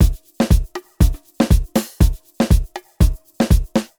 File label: Deep House 120 bpm, drums; Loops; Ableton Live 12 Lite; Core Library